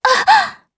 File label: Vox Damage jp; voices; heroes; Laudia; kings raid